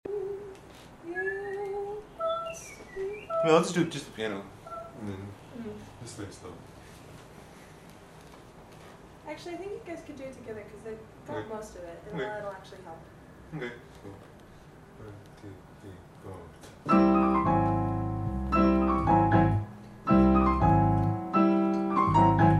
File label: piano